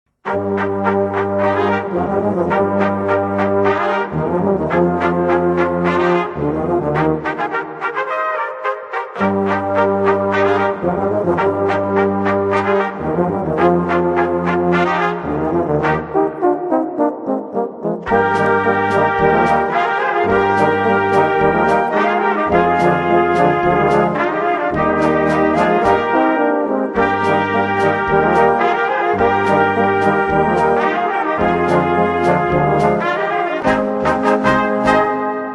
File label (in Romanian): Fanfara